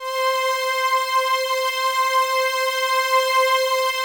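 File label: PAD, atmospheric pads; Best Service; XX Large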